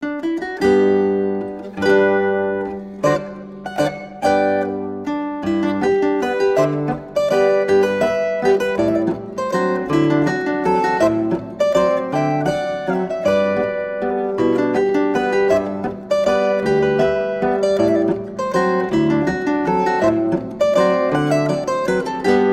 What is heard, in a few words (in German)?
Landler, Zither